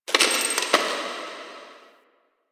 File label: Metal Debris; Warframe; Quests; TheNewWar; Ambience; Zariman